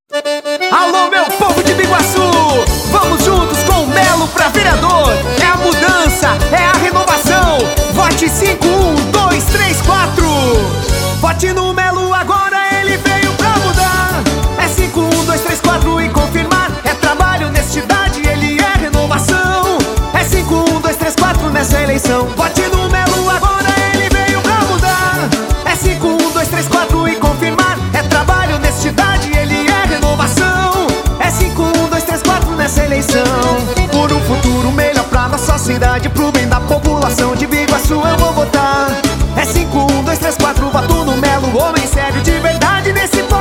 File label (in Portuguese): Festa de crente VEREADOR GOSPEL; Mello iguacu; RENOVACAO